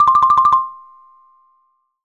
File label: old mobile ringtone